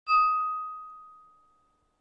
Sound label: ping